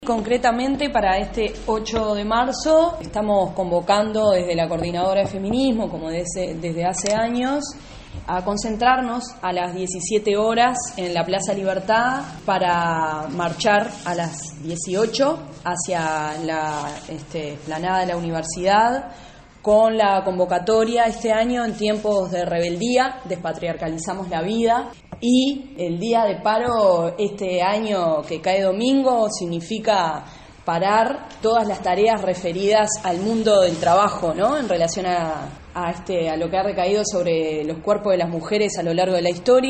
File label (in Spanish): MARCHA FEMINISTA